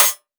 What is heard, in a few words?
Hihat